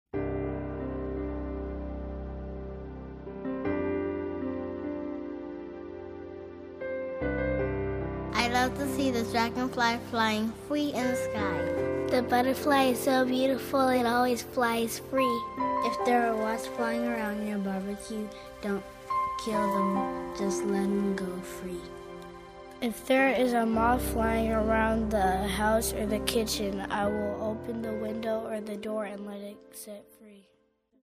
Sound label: Insects Prelude